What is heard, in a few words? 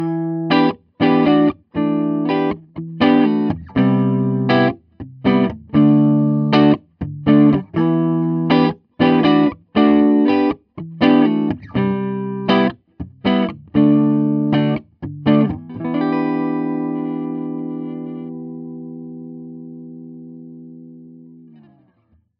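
les paul clean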